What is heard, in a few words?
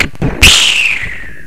random launch; Macworld (1998-10); Games World; Shareware Games; Arcade; idin 2.0